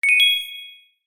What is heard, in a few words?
trophy earned